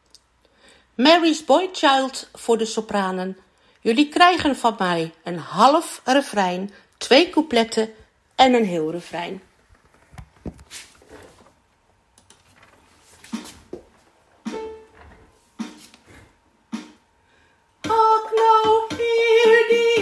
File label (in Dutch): Sopranen